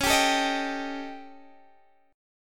Eb 7th Flat 5th Db

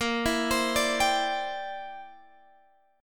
C Major Add 9th, Bb, down, Guitar, Standard; arpeggiated

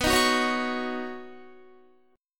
B Major Add 9th, Guitar chord; strummed; 18,18,18,18,18,21

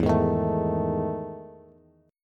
E Major 11th down Bass; EM11 strummed; JGuitar

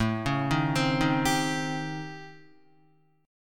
A 7th Sharp 9th Flat 5th, Guitar; 4,4,3,5,3,3; arpeggiated

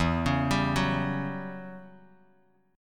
E Minor 6th Add 9th E down Bass Standard; Em6add9 arpeggiated; JGuitar

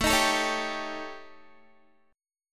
A 7th Flat 5th A, Guitar; A7b5 strummed; 17,18,19,18,19,x; 4E,4A,5D,5G,5C,6F

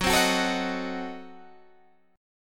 F sharp Diminished 7th F sharp

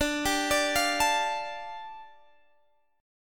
G 7th Suspended 2nd, D, guitar chord

